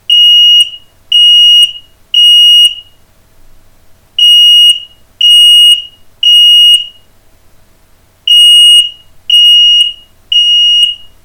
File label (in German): smartwares rm218 rauchmelder alarm